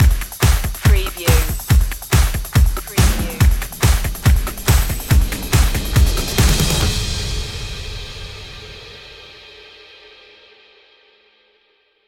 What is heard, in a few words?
bullet trains stinger